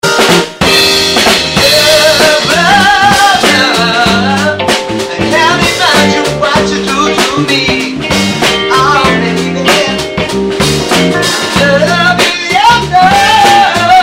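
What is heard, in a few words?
Fender P Bass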